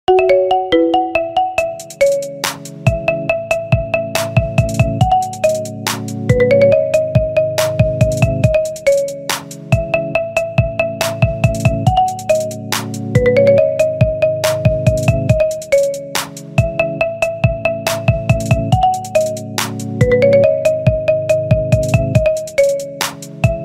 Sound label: iPhone 12 Pro Max